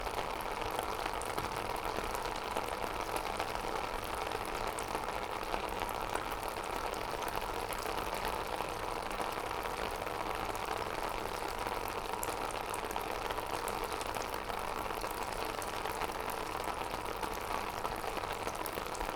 Rain on Umbrella; Sample Rain Library

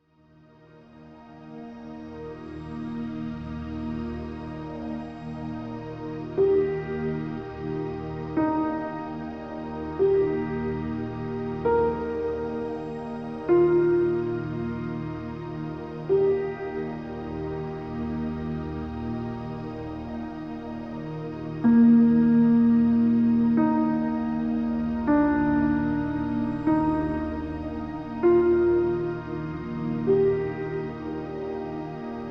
Spa Music Relaxing Music

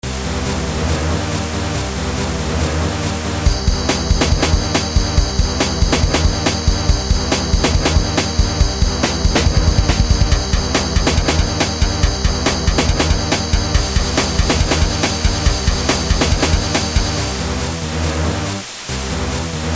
Drumm and Roll

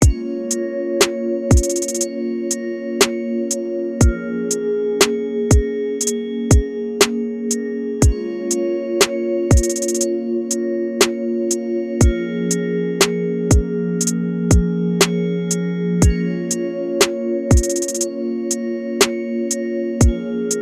Binaural beats

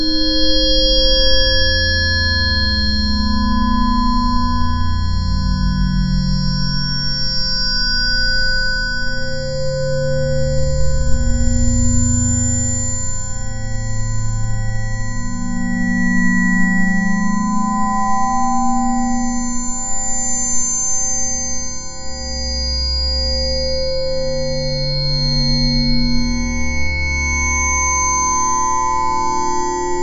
Vaporwave

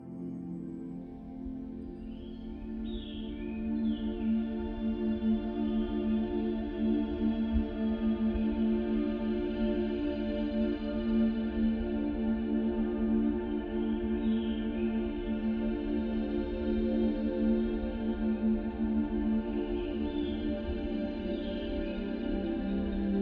calming rainforest sounds

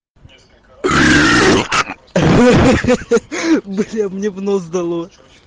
Otryzhka BassBoosted

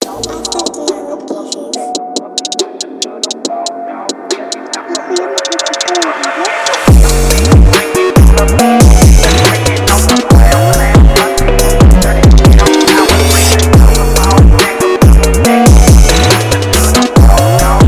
PHONK

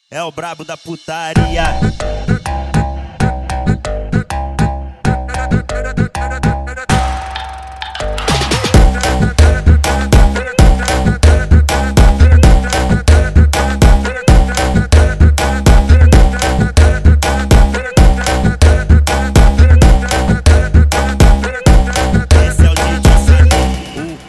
Brazilian Phonk Ritmada

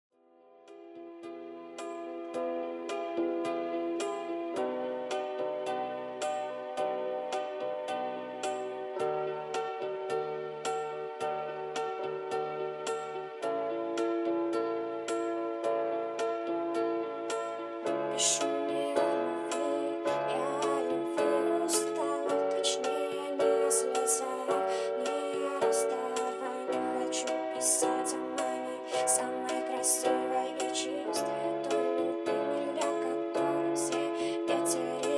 piano

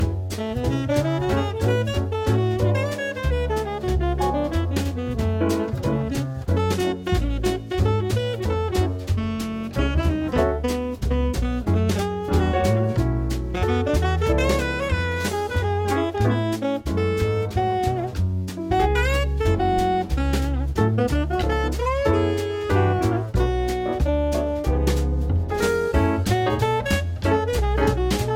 Club Cocktail Jazz

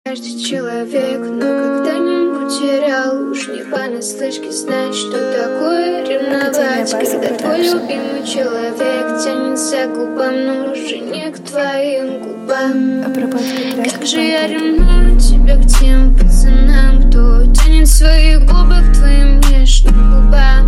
39Hz Low Bass

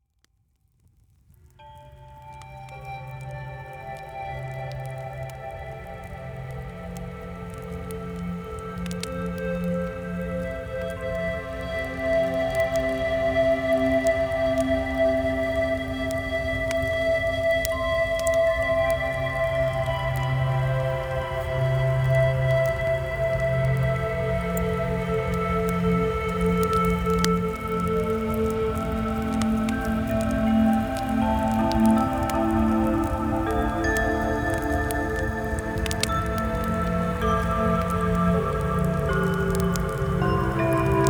Singing Birds

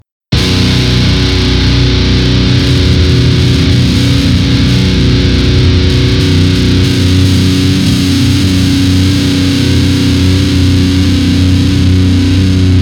Powerdrill

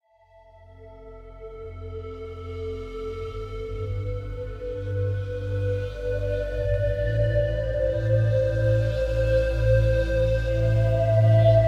Psy Trance

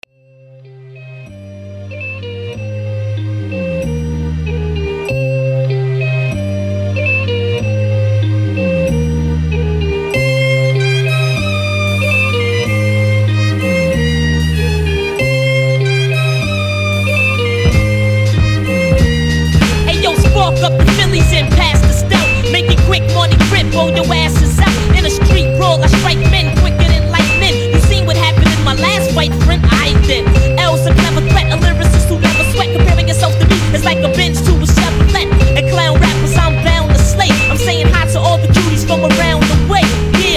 Acapella

